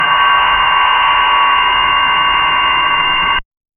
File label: cybernetic data burst